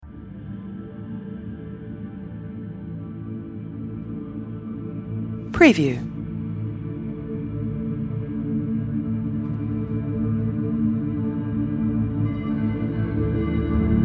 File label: Ocean Ambient Meditation Relaxing Chilling Calm Background Music